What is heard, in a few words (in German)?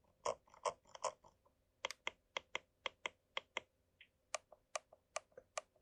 Sound Scroll MM DPI